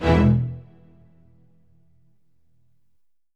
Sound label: ORCH HIT E1; Q Up Arts; Denny Jaeger Private Collection